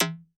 Perc Reso, Vermona Mono15 Drums

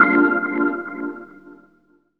DR. LOOP, TRANCE; X-Static Goldmine 3

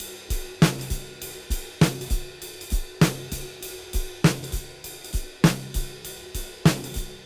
Cym Beat 99 BPM nokik; AMG - Keith LeBlanc Phat Butt Jams

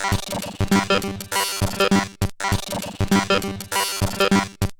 Bent RX17 (100BPM), Circuit Bent

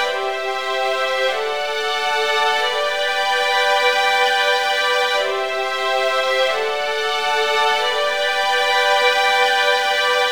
strings, analog funk

